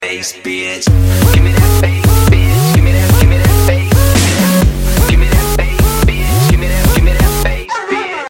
gimme that bass

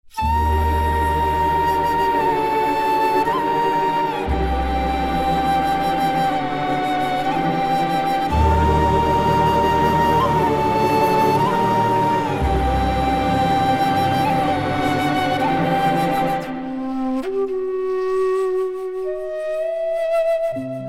BGM; Kabali

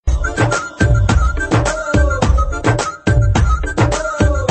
hip hop flute

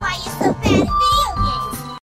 Peacock

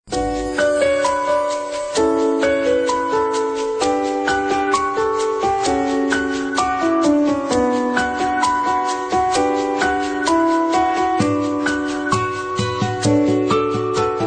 sad flute inst

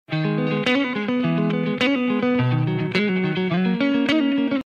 beautiful guitar sms

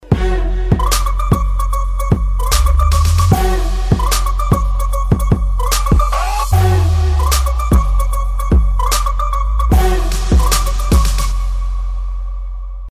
TRAP MSG

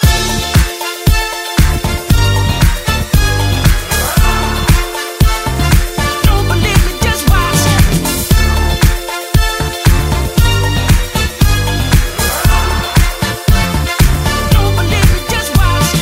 Funk

